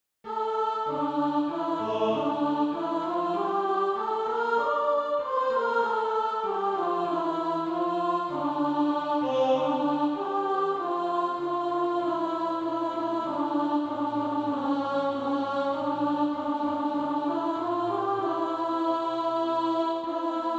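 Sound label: alto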